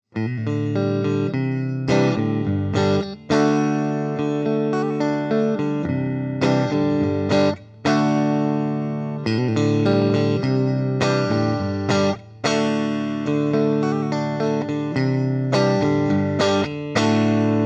neck and middle

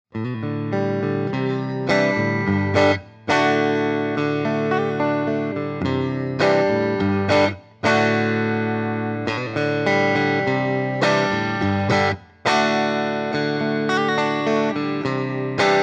6S9H middle

